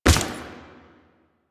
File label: Step Default, Footsteps